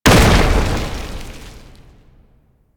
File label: Containment Protocol Impact; Warframe; Warframes; Qorvex